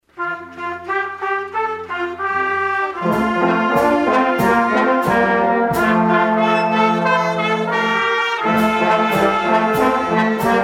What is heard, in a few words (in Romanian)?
Fanfara